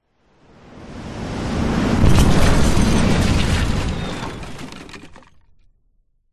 bomb drop jet plane hit explode close sound of plane above short